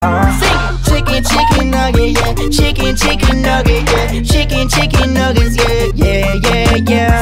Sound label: chicken nugget alerts